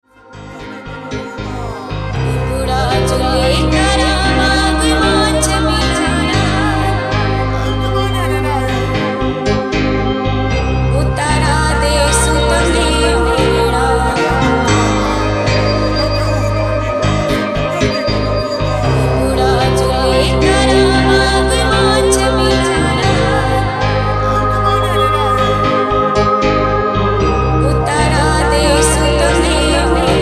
WORLD MUSIC

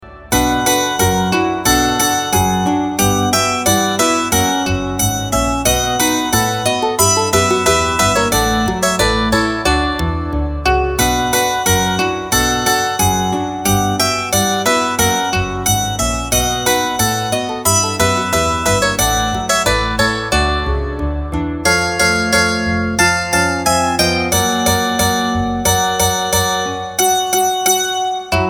Jingle Bells

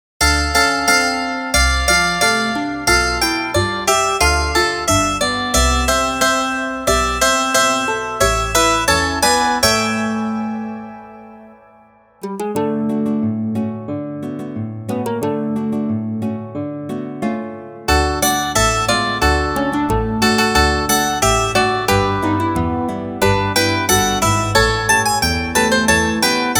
Jingle Bells

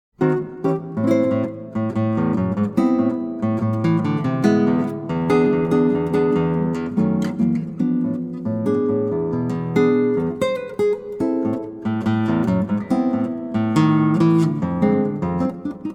Tango

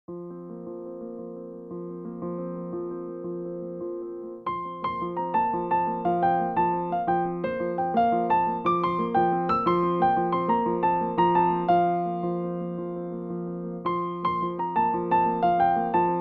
African Dance

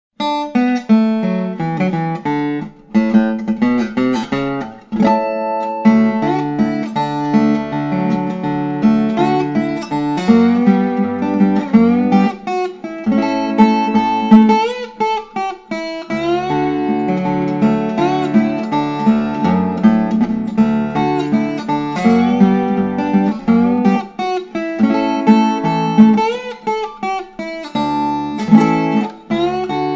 Jingle Bells

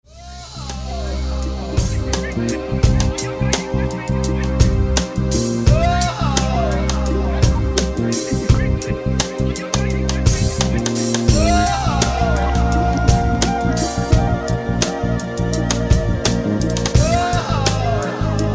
HIP HOP

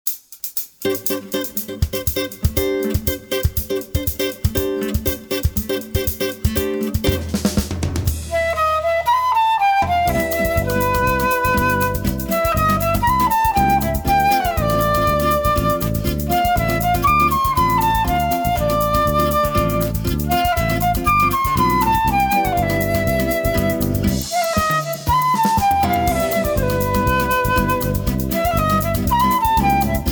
Bossa

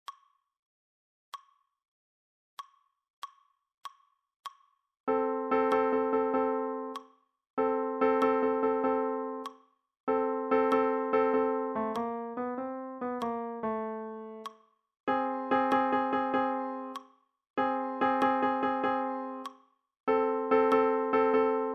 Walking the Blues

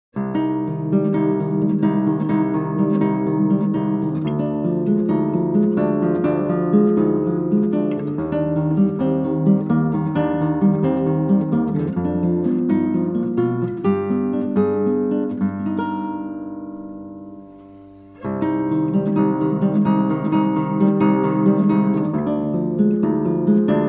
Prelude

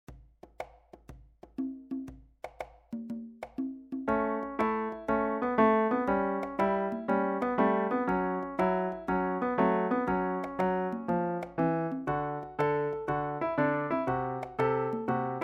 Shuffle

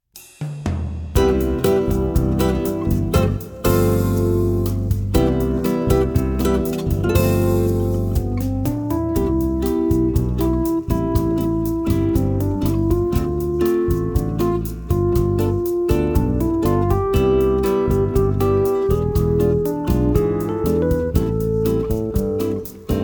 Latin Breeze

